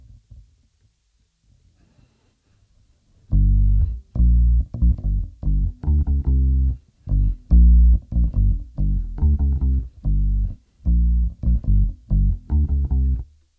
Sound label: Bass